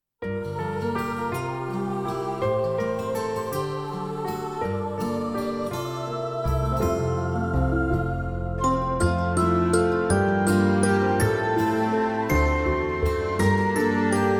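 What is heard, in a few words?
instrumental version; O GWIAZDO BETLEJEMSKA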